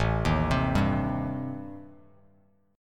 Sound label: A-Minor 7th Flat 5th, Bass Standard, chord; Am7b5 arpeggiated; JGuitar